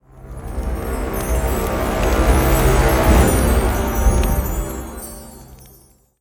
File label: win Line Flashing; jumanji